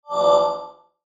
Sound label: teleport; free rider hd